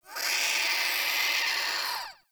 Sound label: Creature; Ambience; Corrupted Ode